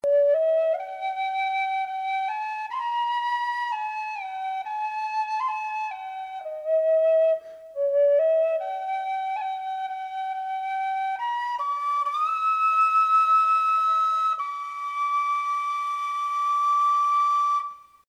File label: overton high d, tinwhistle